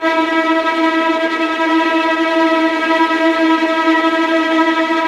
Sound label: VIOLINT F#, Strings; ILIO